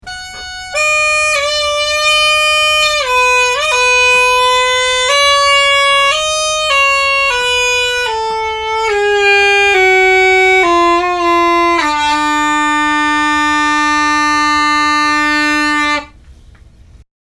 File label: Lefty Half Set, uilleann; Peebles; Seth Gallagher; john peebles